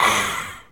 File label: done eating; SpongeBob Diner Dash